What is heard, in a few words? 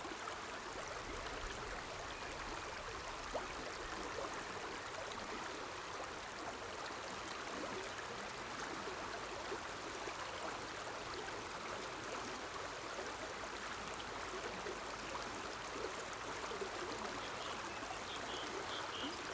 Beta with Mountain Stream